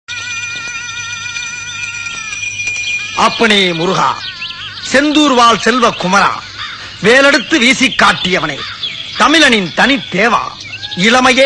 Tamil South Indian, ringtone

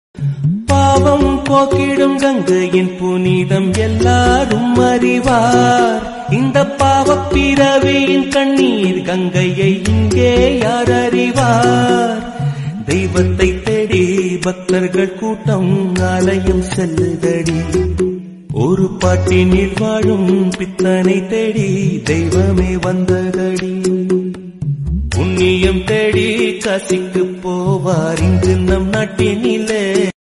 Sad Tamil Song Ringtone

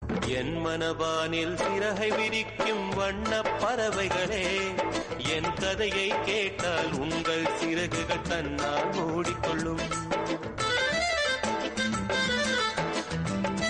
Tamil Song; Kaasi

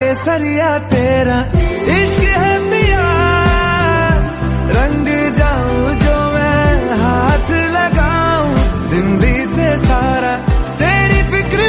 Hindi, ringtone; Brahmastra

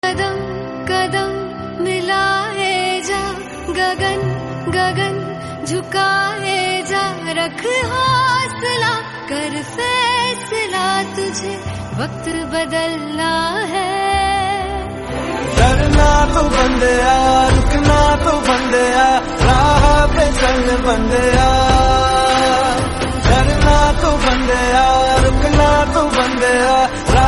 Hindi Song

